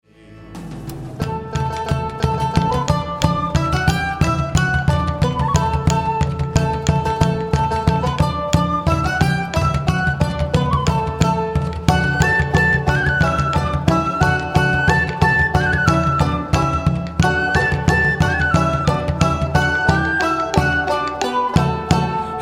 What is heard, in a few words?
Spanish Lady